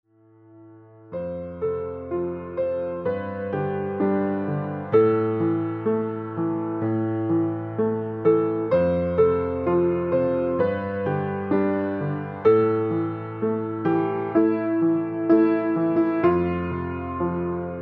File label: piano Sample